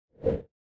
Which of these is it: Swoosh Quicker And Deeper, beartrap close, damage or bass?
Swoosh Quicker And Deeper